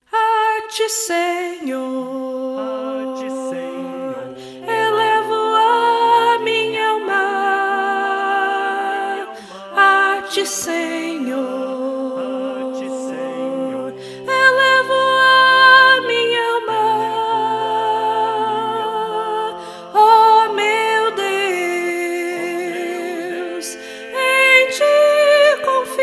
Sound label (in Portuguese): a ti senhor soprano 1